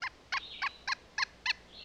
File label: birds, ambient animal; Counter Strike Model Pack; CS:GO